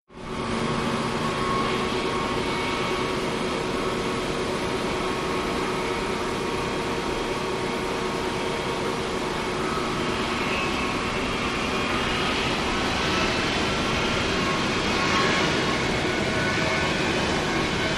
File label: Jetliner Taxis Up To, airport